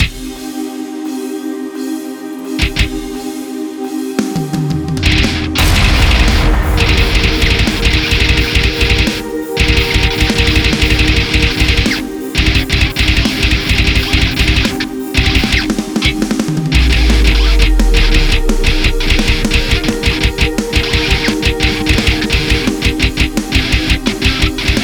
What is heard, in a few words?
Silent Metal